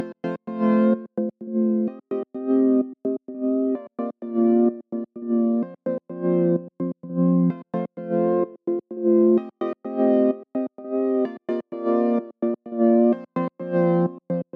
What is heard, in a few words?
ARP DRY